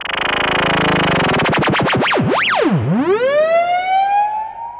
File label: fx; ringtones; Google; NewWave Labs